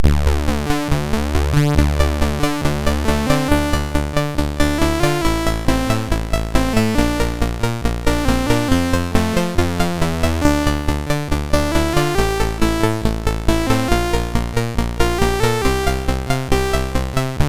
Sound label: Block n Bubble sid MOS6581R2; hvsc